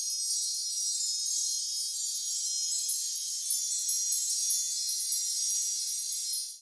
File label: Twinkle